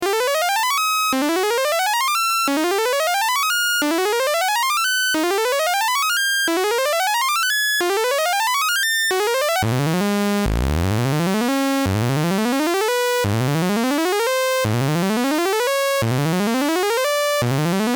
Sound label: vco sawtooth with seq; analog synth